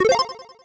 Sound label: menu; Fur War; chisme